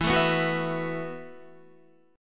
E Suspended 2nd Suspended 4th E, Guitar, 4F,4A,5C,5G,5C,6E; strummed; JGuitar